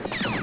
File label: Laser; Starbound II